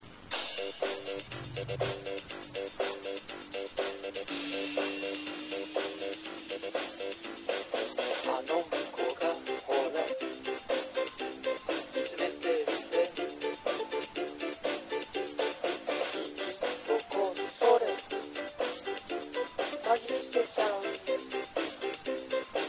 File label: taiko